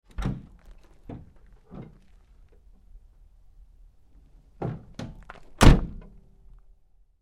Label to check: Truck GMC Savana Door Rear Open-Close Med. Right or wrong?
Right